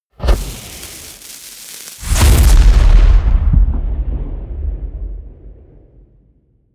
spell fireball cast large; explosionen; comedy-cartoon; soundpool

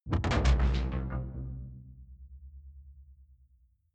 sci-fi alien splash impact dark; swooshes-swishes

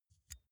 pull out xlr extension; objekte; elektronik

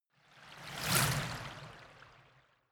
whoosh

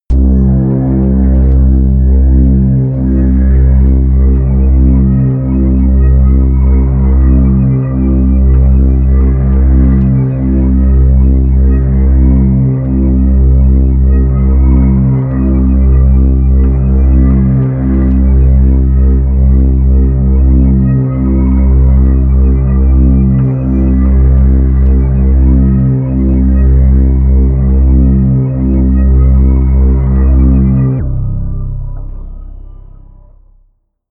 drone apocalyptic bass

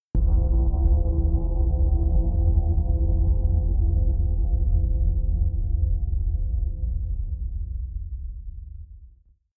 synth hit sub space; whooshes rises

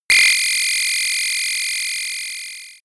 energy beam, laser; krieg-waffen; soundpool

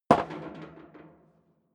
step sneaker metal rattle single right

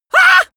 shock shout male; vocals; comedy-cartoon; soundpool

